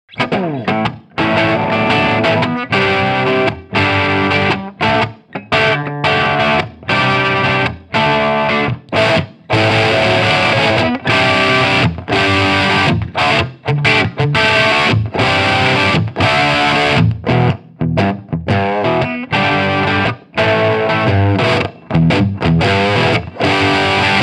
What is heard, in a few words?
Engl Retro on off